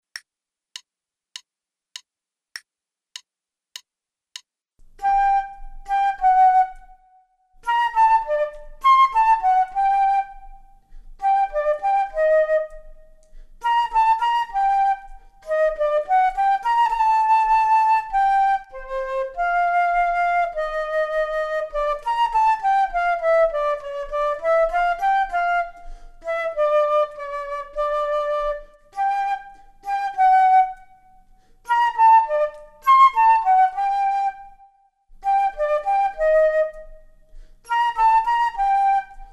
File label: Duets flute; Selected Duets for Flute Podcast